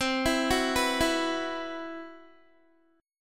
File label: C Major 7th Flat 5th down Guitar; CM7b5 arpeggiated; JGuitar